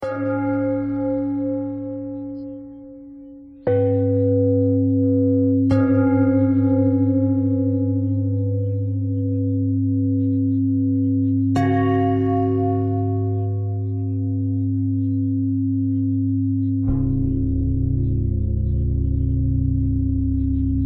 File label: bell bell thread simple, bells